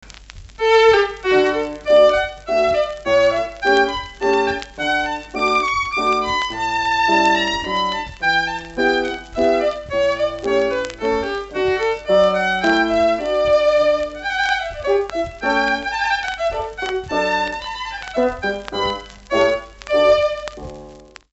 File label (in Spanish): ondioline